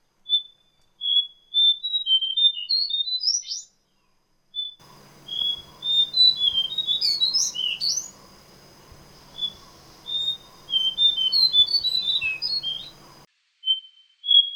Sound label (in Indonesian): suara cingcoang coklat